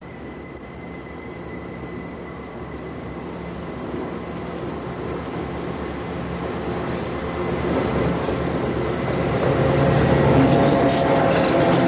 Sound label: El Train Pass By Click